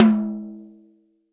high tom sound g sharp key, Royality free tom drum single hit tuned to the G# note.